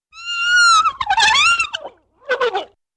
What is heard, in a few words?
Frightened Kitten, cat; animals